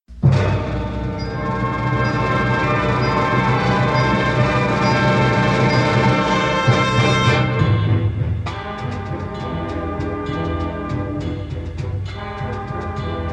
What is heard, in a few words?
Jazz Rock Symphonia